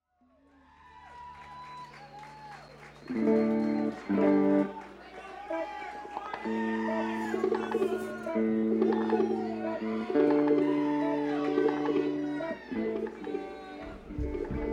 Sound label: Moog Jam; The Vogue Theater Indianapolis IN USA